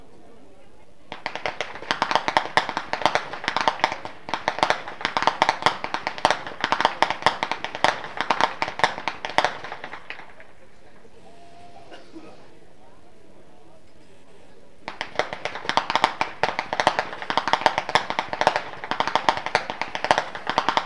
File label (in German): schnalzen, flachgau; liefering